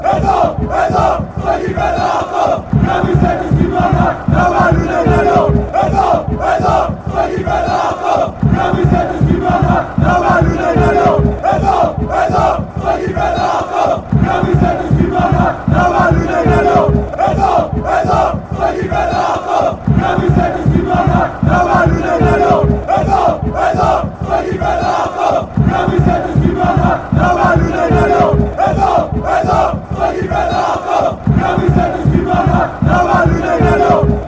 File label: greece chant, fanchants